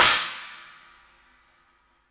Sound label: CYMBALS; 3D Sound; 3D Drums